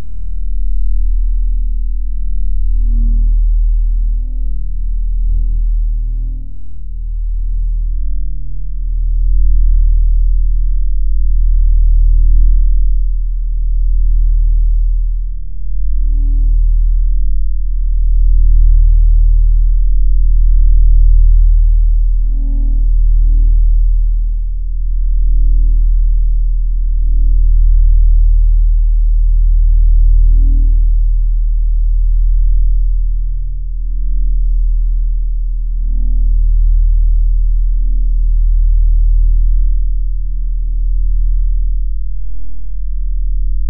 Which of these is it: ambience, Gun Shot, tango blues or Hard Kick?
ambience